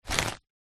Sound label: purse pouch leather containing coins and notes grab pick up; zapsplat; foley